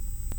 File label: Hydrophone